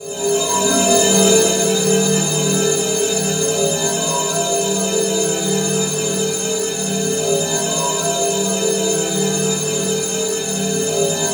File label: atmo, atmosph, special fx twisted; USB Soundscan; AKAI